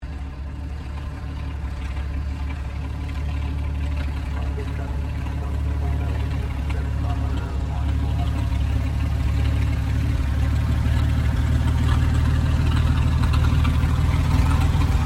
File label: AIRCRAFT CESSNA O-1 BIRDDOG TAXI SHUTDOWN; Cessna Birddog spotter aircraft; Airplanes (Sound Effects:Vehicles)